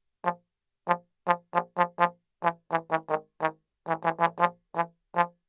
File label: tut